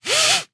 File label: car seat belt adjust